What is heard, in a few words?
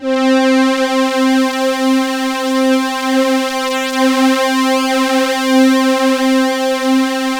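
C4 big orchestra; Sound Gallery - Charged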